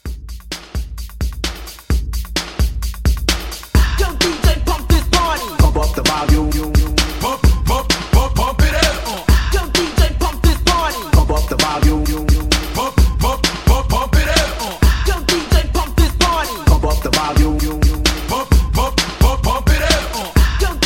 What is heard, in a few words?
80s Mashup Hype Re Drum 130